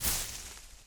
Moss Knight Burst Leaves

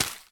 Footstep Crayon Paper; viewfinder

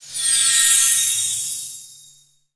Great Heal

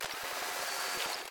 Purple Mounted Camera Photo Print; viewfinder

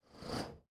Mech Footstep Texture Rewind; starbites

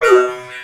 Male Hurt Robot; gamerip; Mars Sortie

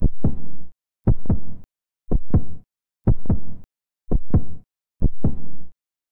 Menu Heartbeat; Hollow Knight (gamerip) (2018)

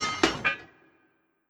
Tank Metal

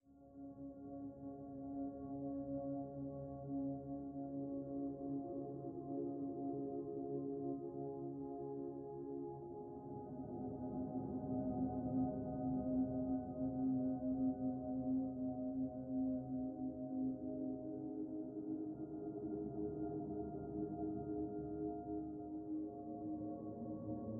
Lighthouse Ambience; gamerip; light of the mountain

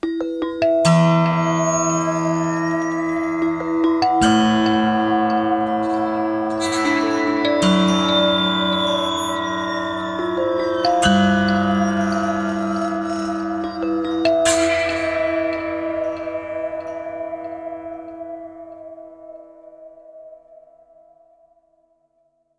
shrine; curious expedition 2 macos ps4 switch windows xbox one xbox series xs gamerip 2021

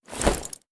Armor Drop

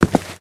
Footstep Walk Base

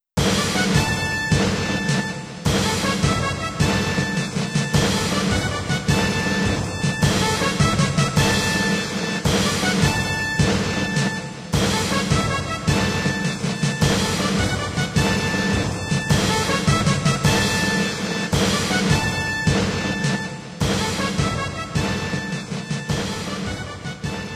Goals Complete; Buildalot

